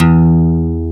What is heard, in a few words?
E2, Acoustic Guitars; AKAI S6000 CD-ROM - Ultimate